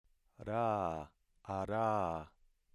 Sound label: voiced alveolar tap